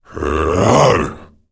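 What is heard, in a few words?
afflicted male attack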